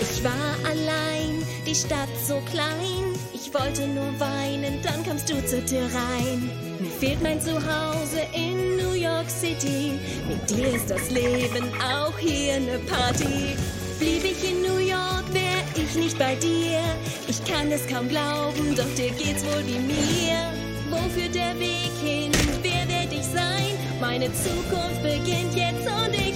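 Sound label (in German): Gesang Zeichentrick, Children's Voice (Kinderstimme), German; Gesang - Trickfilm; Vocals (Gesang), Trick; Big Nate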